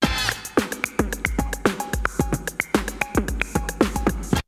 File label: Drumloop; ZERO G - Datafile DISC 3